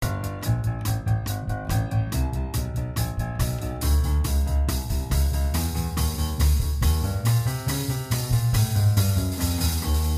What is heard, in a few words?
music track; Ed Edd n Eddy